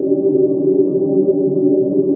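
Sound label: ichthyosaur ambience; hl2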